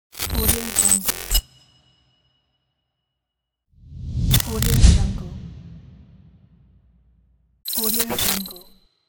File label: digital transition glitch